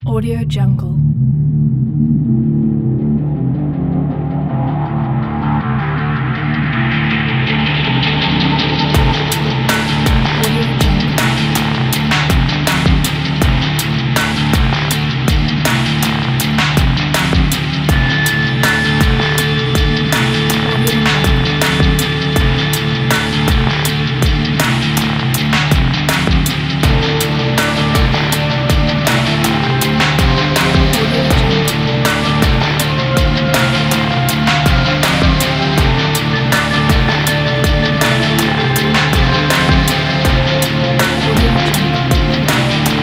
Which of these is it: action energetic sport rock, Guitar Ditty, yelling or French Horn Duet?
action energetic sport rock